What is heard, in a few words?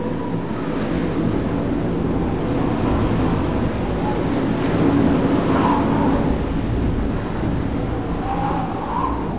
wind